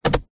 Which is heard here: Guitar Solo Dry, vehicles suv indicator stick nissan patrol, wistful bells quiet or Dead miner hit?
vehicles suv indicator stick nissan patrol